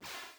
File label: spawn; SledgeHammer; ppg-modkit